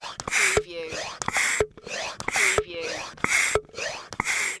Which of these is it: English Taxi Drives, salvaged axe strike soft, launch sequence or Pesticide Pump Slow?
Pesticide Pump Slow